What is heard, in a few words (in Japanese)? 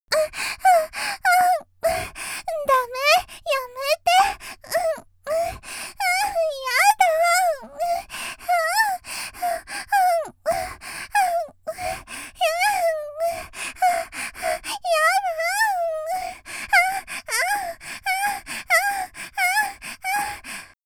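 （少女）「喘ぎ」（だめ、やめて）と言いながら（嬉しそう）, 元気な少女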